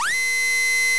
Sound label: dentist